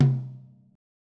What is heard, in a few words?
tom